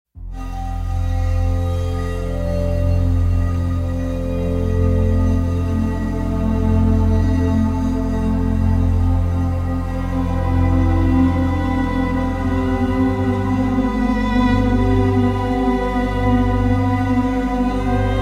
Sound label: Violin Sky Northern Lights Drone